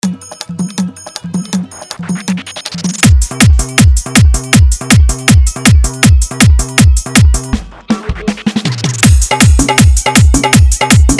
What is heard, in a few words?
Hard Dhollki Bass Mix